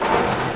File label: amb tech; Abuse